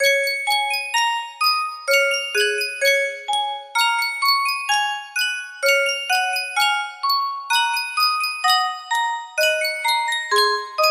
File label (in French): boite a musique, au clair de la lune